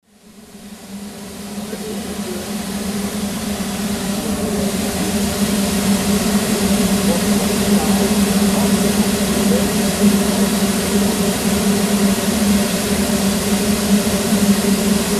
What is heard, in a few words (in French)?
Echappement locomotive à vapeur; MZ-N710; CFHR